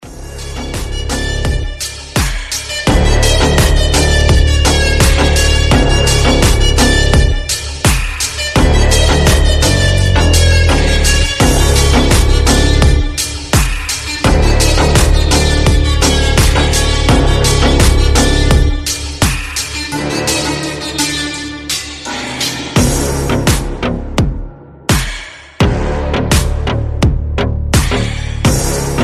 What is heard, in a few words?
Deep House Music Ringtone